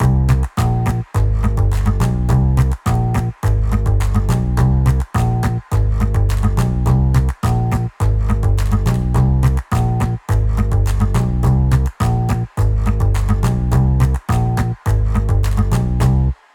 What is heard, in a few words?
Swing Background; rockpop